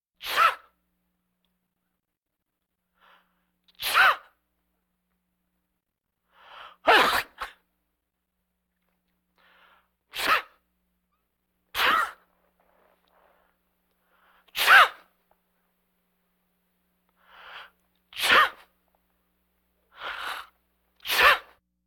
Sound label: Vocal effects breathing Moaning Baby